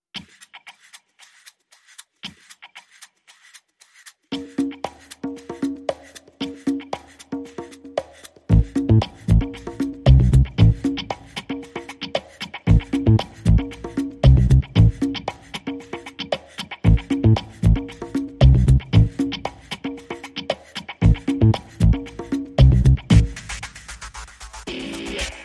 No Flute

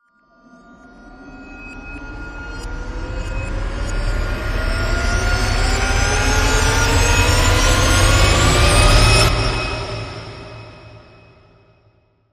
Magic Reverse Rise

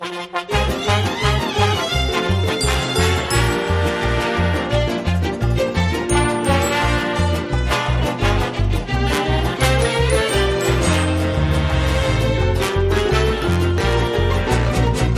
Light No Drums

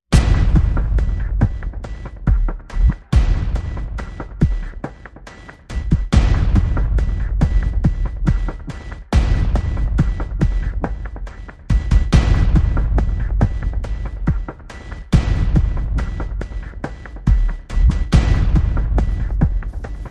Perc and FX Only

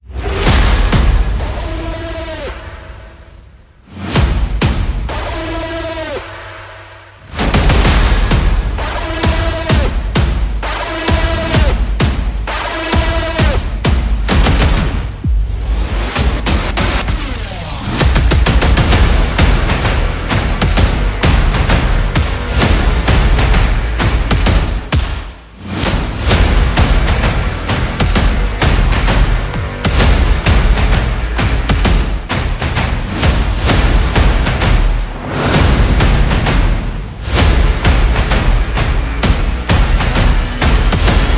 Light Synths

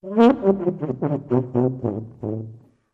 Laughing Tuba

